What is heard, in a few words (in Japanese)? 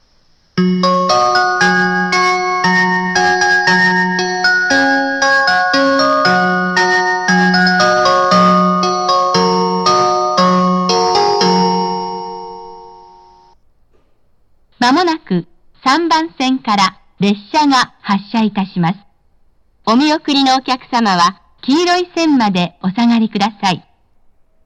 発車メロディー; ワンマン運転時は鳴りません。; 山形駅; 鉄道